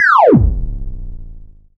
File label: Sci fi